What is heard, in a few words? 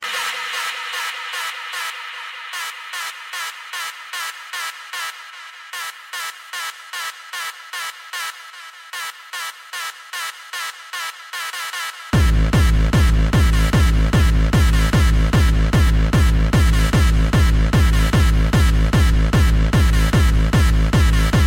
Hardstyle